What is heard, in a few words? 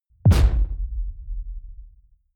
sci-fi space pirate gun fire clean; pistolen gewehre